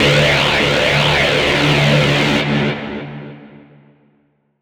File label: Synth Phaser, 150, D